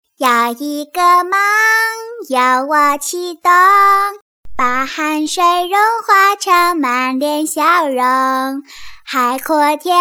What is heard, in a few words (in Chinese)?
童声演唱 女童演唱; 国语女声配音; 我是明星